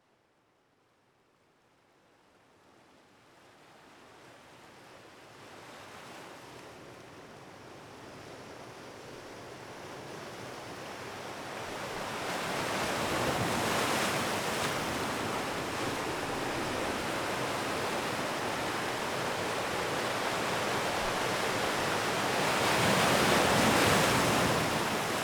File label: Pleasant Beach, Ocean Waves